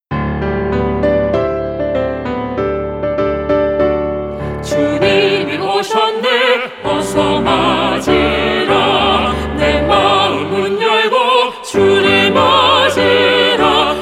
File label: CHOIR